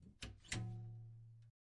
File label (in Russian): zvuki zerkala